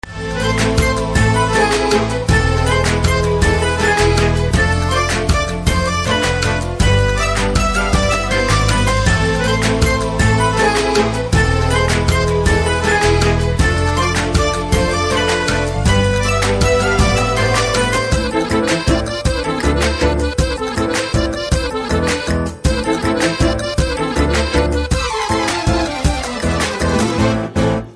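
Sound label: background music Ringtone; Nithiin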